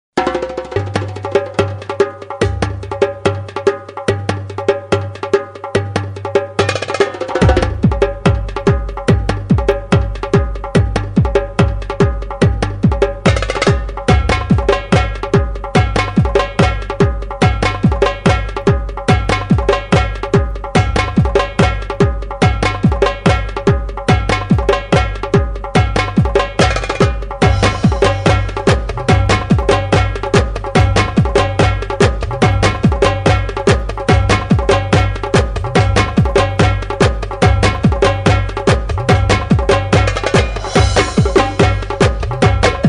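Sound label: Darabuka, Djembe, Shaker, Cymbals, Udu, Sampler; Timeline: One Bar Clave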